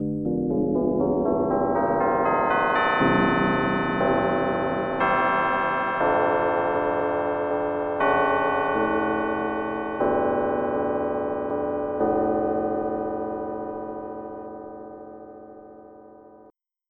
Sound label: Tubular Bell; EverQuest